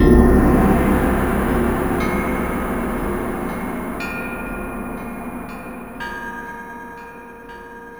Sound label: eastern ambient